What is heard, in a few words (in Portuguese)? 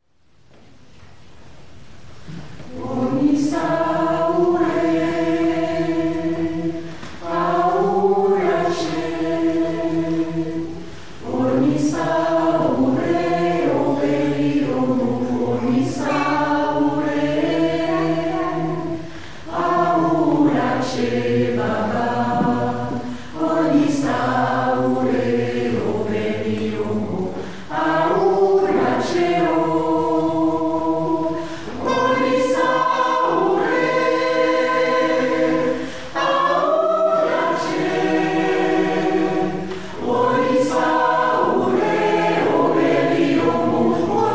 Canto de Candomble